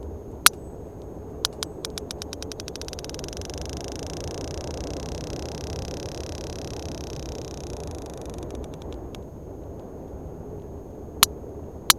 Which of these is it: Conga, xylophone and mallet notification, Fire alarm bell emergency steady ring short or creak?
creak